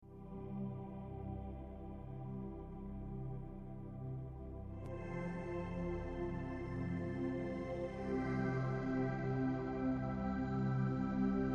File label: CosmicDrone